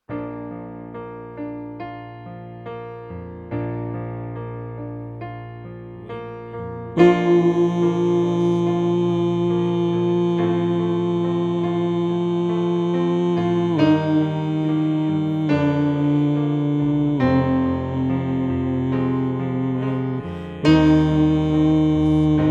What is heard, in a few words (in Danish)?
Sopran; oevefiler